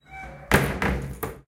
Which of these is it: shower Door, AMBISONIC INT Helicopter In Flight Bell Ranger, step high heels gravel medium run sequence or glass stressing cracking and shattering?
shower Door